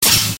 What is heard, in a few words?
laser; plague